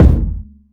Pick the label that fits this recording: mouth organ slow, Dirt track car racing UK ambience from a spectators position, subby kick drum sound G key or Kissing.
subby kick drum sound G key